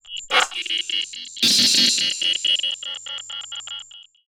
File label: Ring Mod, Cymbal, contact mic; Murf & Ring Mod